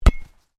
Football hit post, Soccer Ball: Hit The Post, Metal; Sports